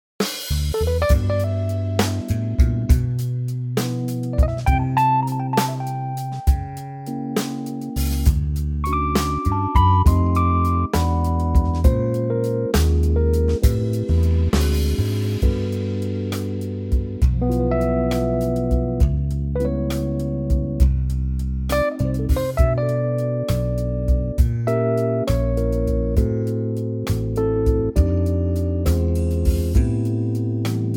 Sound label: G smooth; female